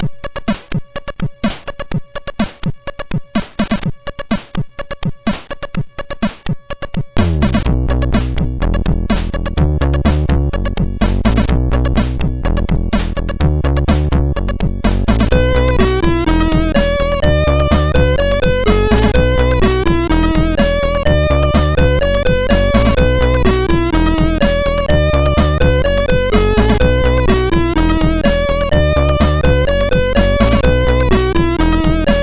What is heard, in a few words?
Intro, sid MOS6581R2; Achmed